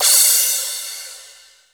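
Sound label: Cym Flange Crash, Drum Machines Alesis D4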